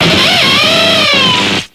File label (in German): Ruf